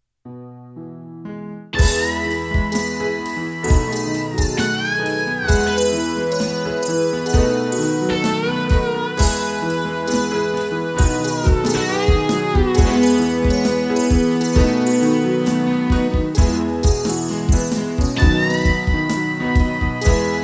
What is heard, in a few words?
inst